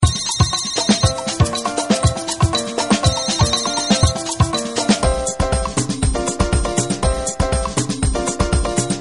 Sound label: iphone 11 cubano